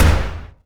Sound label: mg end indoor